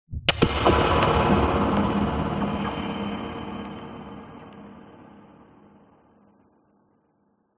sound design cinematic hit loud bright tense horror reverb